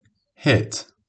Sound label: hit